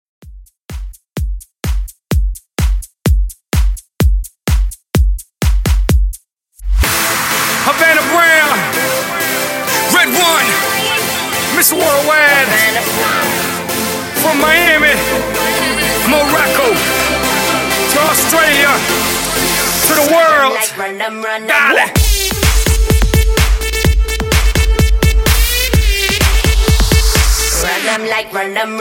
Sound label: ThrowBack Dance Redrum 127 Bpm